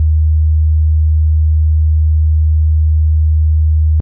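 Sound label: Clean Sine DX100 D#0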